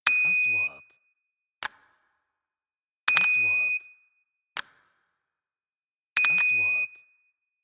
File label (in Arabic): نغمة تنبيه خطأ; transitions